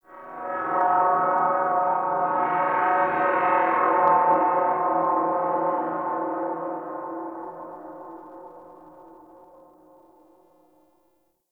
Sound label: Distant Drone; Ambience; Sentient; Warframe; Tau